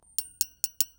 metal rock medal flip soft

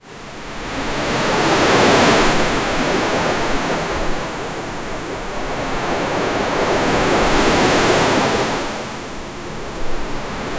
calamity

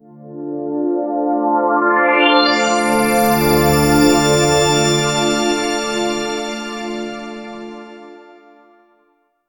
a warm golden cinematic r, positive

